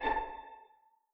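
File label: string pizzicato A4 pianissimo right; Sound On Sound - Peter Siedleczek's Orchestra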